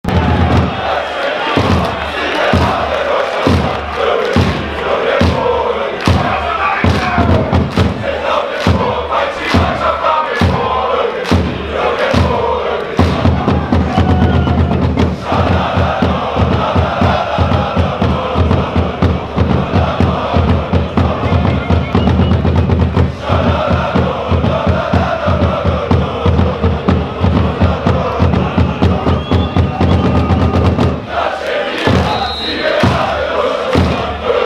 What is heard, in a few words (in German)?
Klatsch in die Hand